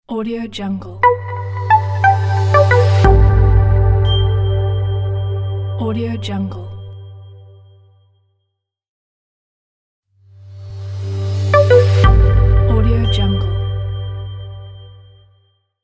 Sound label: minimal clear logo